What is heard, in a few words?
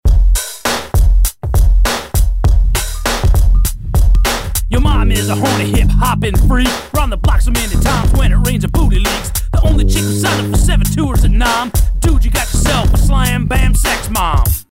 ringtone